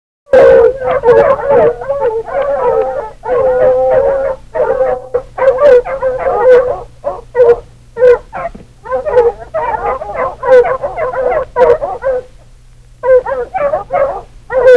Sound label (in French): chiens